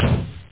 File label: door open